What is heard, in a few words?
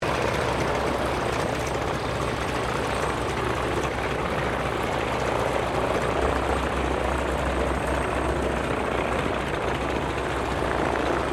AIRCRAFT STINSON RELIANT SR-8C IDLE; 1935; Airplanes; Vehicles